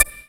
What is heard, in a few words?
BAMBOO; Best Service Dream Experience